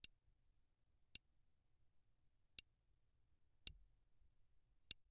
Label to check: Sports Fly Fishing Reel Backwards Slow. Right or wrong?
Right